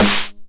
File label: 909 SD Tape Sat